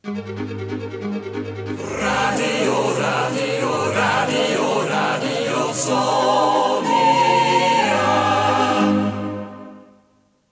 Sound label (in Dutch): Draaiorgel; Radio Zoniën; jingles